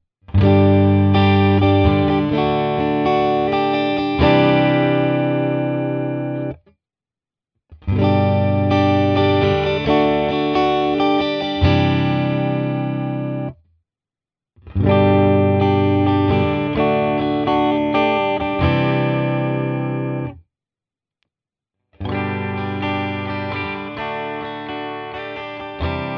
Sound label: AZS clean all positions Strumming